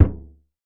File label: Frame Drum Head, contact mic; Raw